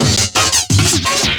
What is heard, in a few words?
Dieing Amen FX, Loops, breaks; m8 breaks